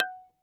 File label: F#4, Yamaha VP-1; Music Store; 90's Sample CDs; MIYABI